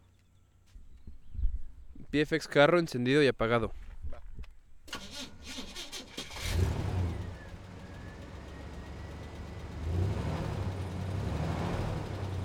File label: Old Car Start Stop